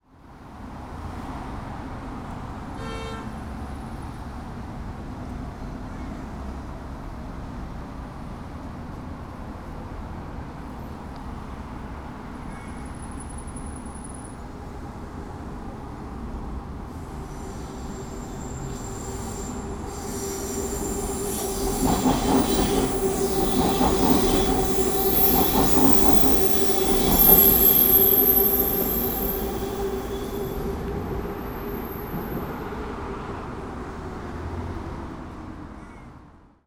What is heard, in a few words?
Vancouver SkyTrain